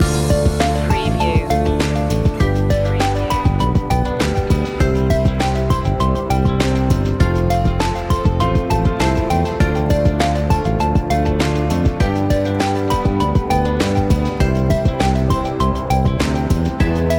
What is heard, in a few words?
peaceful trees loop end; media music now